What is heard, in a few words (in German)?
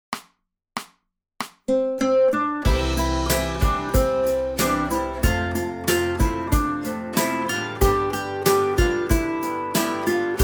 Das Nashornlied Melodie, Gitarrenschule; Titel 28 – Das Nashornlied (Melodie); die bunte gitarrenschule band 2